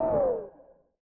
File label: Aircrafts Flaps End In; DCS